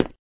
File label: tile, footsteps